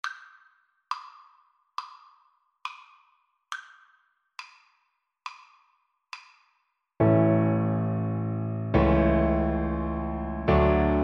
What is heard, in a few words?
twinkle twinkle viola